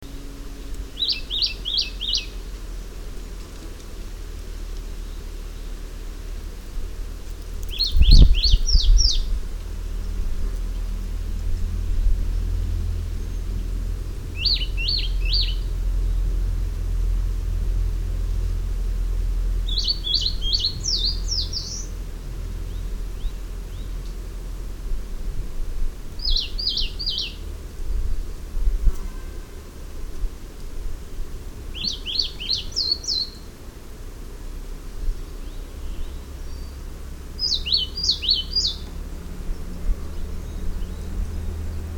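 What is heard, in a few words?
svetlogolovaya penochka golos, warbler voice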